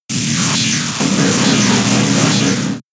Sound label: Screech; pokemon sfx gen 7 attack moves sumo usum